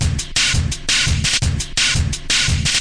170 bpm industrial stepper, drum loop

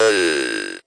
speak n spell ehhhyyy, CIRCUITBENT; Speak and Spell

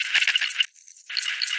whip whip whip; LOOPING AMBIENCE

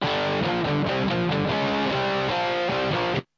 bad metal, guitars

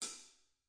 hat, DRUMS, REAL LIVE KITS; Osama Qureshi DrumKit

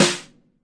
metal sd, Snares; single hits

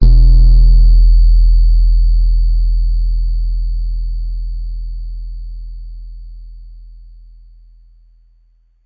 bass bin exploder 142hz; Basses

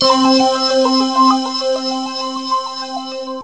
phaseo ring, Pads

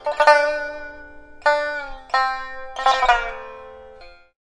koto sounds; WORLD

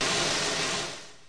brushing sd, snare; single hits

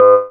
meep, electronic hits

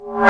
Electro FX; Ueb HE Electro FX

